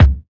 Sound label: bumpin kick single shot c key, kickdrum sample tuned to the C note